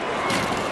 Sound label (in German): spieler kollision wand; national hockey league 2019